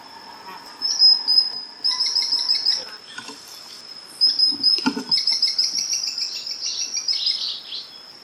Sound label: Pied puffbird